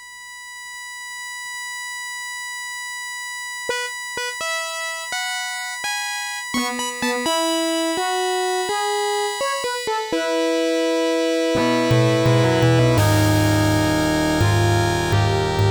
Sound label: SB AW16AISP Line Out EVGA16; Space Quest IV: Roger Wilco and the Time Rippers; Crystal 3D Sound Card